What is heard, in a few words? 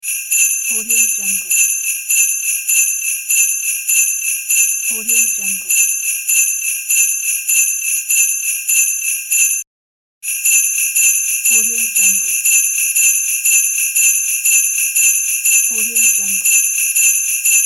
sleight bells pack; z-studio